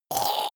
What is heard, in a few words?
monster choking zombie short; comedy-cartoon; soundpool